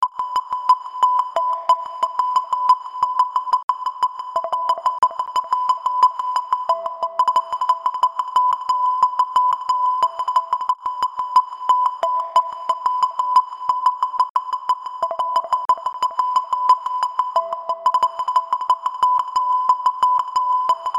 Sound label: Smooth Tune